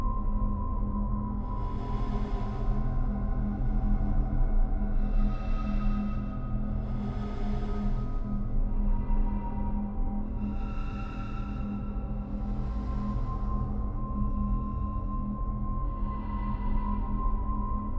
Ambience creep

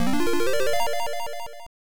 Fanfare; Ganbare Goemon Gaiden 2: Tenka no Zaiho; Family Computer

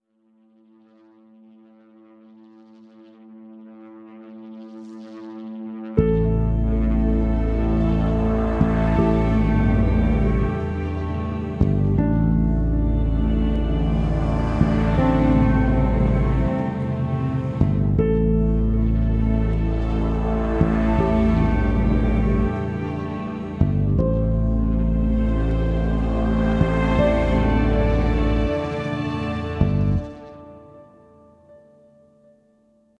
System Alert Intro; Terra Invicta

